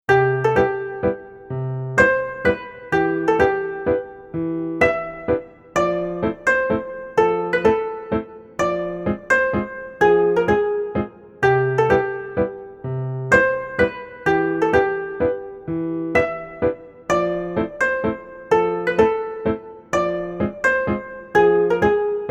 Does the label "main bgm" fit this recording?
Yes